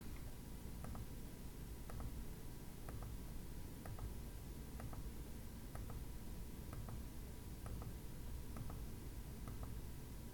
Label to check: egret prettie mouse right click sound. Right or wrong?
Right